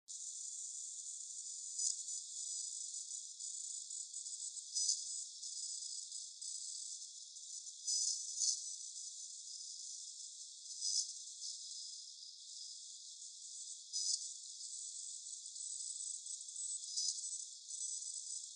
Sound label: lenovo t520 screech